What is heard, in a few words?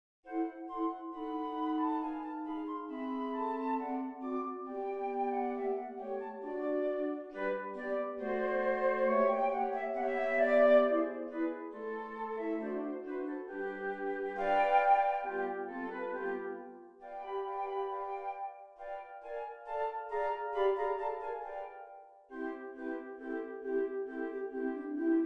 March of the Marionettes FLUTE QUARTET